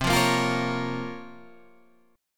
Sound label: Bb Minor C, Bbm/C; strummed; JGuitar